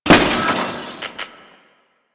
electronics misc destroy

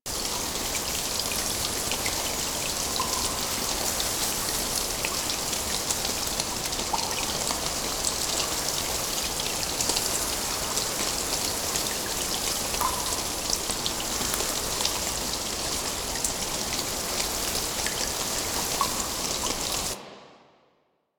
Wind Tree Sad; Warframe; Duviri

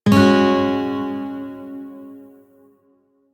Shawzin Yo Chord G, Music; Warframe

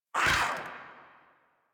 Catbrow Combat Vocal Attack Short; Warframe

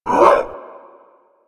Infested Melee Grunt; Warframe

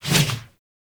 Wolf Paw Swipe, Attack; Animals

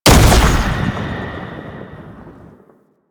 Unyielding Gallop Hit; Warframe; Dagath

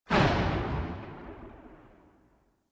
Arch Burst Gun Tail, Weapons; DE; Warframe; Archwing

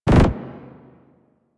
Corrupted Notes M; Warframe; Bard Quest; Bard Boss Fight

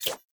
Dagger Whoosh; Weapons; Enemy; Daggers; Sinecure Dagger

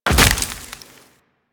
Land Heavy Veg, Footsteps; Warframe; Tenno

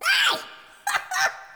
Sprout Vocal Surprised; Friendly; Sproutfolk; Fey Hub Sprout

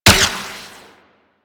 Flare Launch; DE; Warframe; Weapons; Archwing; Powers

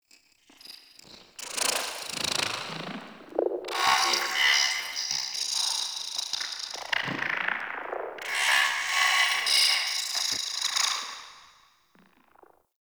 Tech One Off, Ambience; Vitruvian Memory

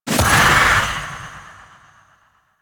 Fairy Soul Impact; DE; Warframe

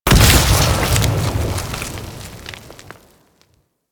Ruptured Explosion; Enemies; Abilities; Warframe; Corpus; Arachnoid Hunger

